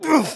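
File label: pain sharp, German; Scout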